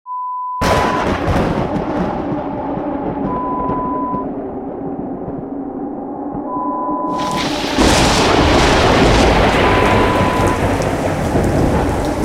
Howling Thunder Storm, Horror Sound Effect

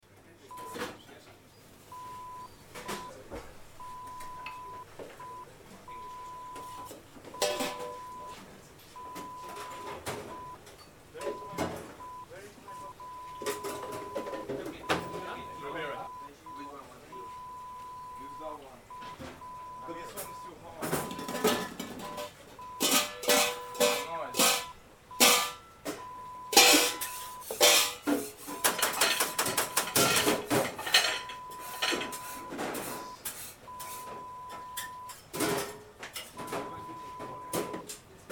Kitchen Atmos

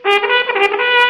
alarm

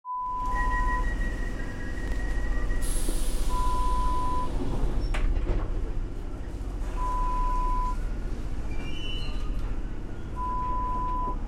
subway train inside sound

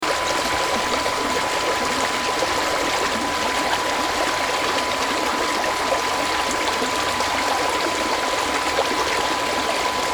Babb Brook On Bridge

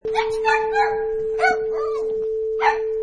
Small Dog Bark

